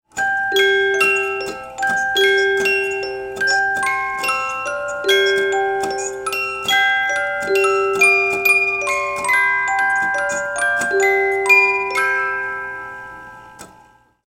Peaceful Lullaby Music Box Theme; a dump in the dark

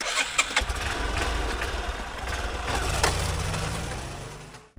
engine start and go; Kovox Pitch; PS4

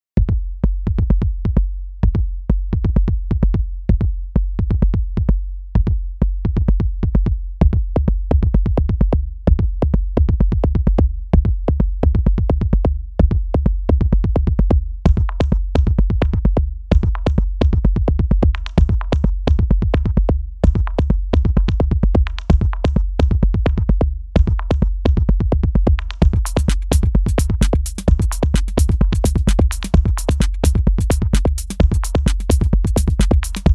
Noise

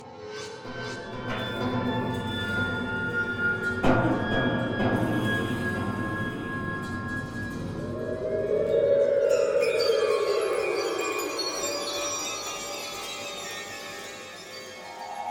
Puzzle Loop

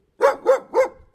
Dog

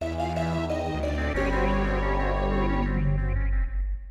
Atlantis Realm Fanfare; Glover; Nintendo 64

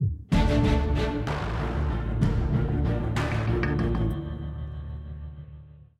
190bpm; Legends of Pegasus; windows gamerip 2012

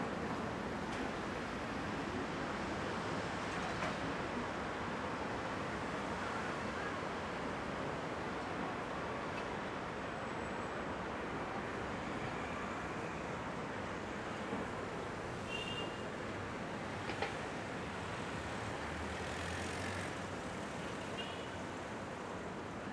City Loop

